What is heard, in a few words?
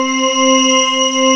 NAPPE 3, PPG WAVE; East West - Global Sounds; PPGWAVE22